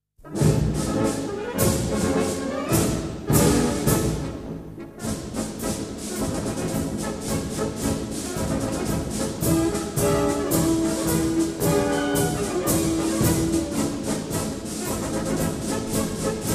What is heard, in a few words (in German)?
Wagramer Grenadier Marsch; austria